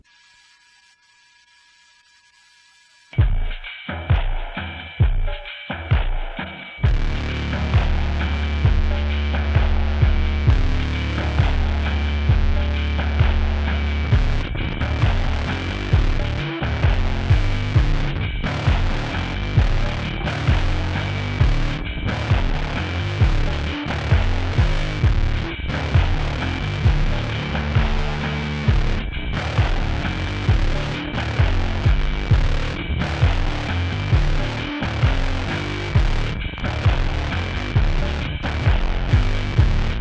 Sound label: distorted bass riff (left), vinyl sampled beat (right)